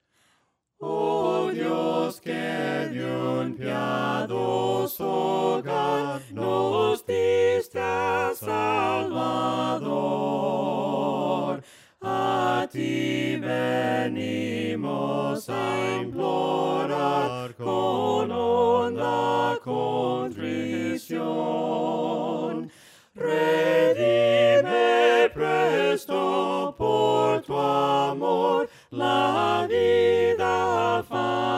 voces; cantos del camino

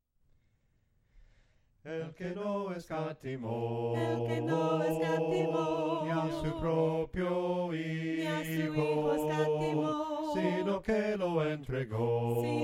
EL QUE NO ESCATIMO SOPRANO CANTADO, himnos en melodia soprano; cantos del camino

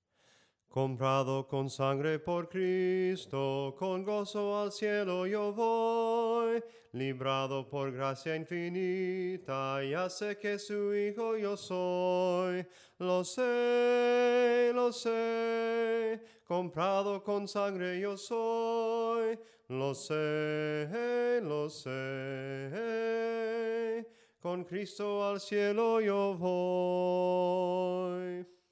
SOPRANO CANTADO

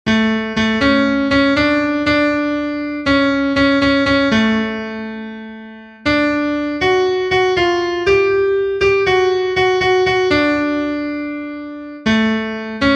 CONTRALTO, himnos en piano; cantos del camino

